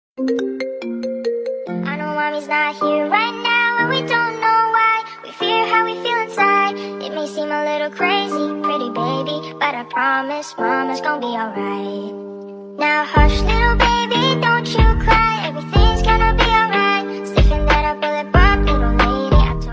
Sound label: Iphone Remix Song